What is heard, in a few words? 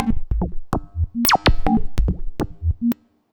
Mod Loop, Modular Percussion